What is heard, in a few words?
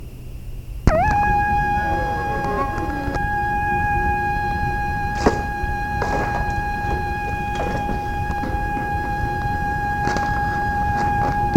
flutes